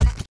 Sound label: Step